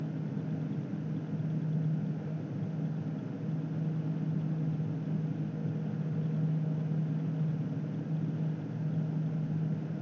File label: 1000 RPM